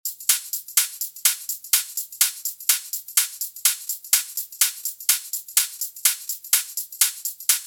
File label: Top Loop Melodic Techno